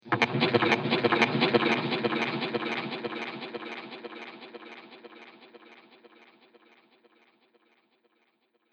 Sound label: Strange Robotic Insect Sounds